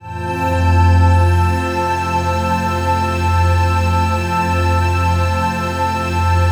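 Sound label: trance pad; Best Service; XX Large - Pads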